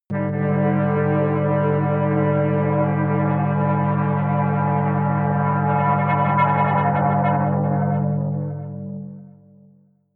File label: atmospheric feedback synthesizer long medium, drones